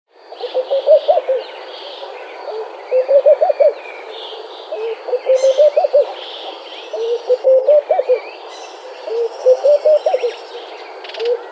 Laughing Dove

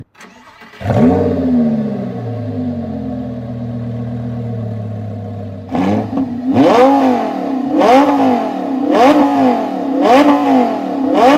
Lambo Superleggera

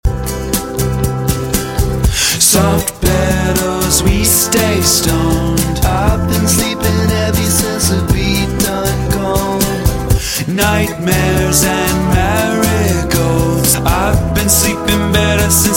Soft Pedals

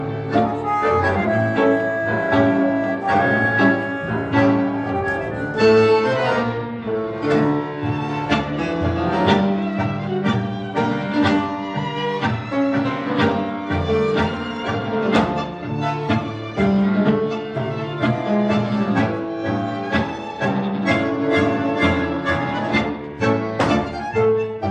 Tango Argentina

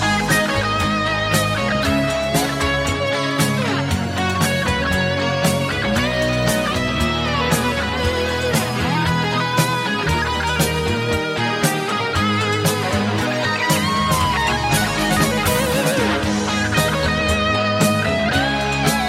Guitar Solo

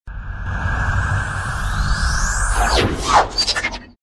Transforming

